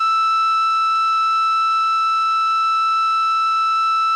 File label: CALLIOPE E4; Sonic Reality; American Heartland